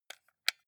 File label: console controller xbox onoff press slow